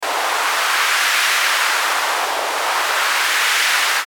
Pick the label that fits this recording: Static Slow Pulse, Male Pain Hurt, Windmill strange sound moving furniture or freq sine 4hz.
Static Slow Pulse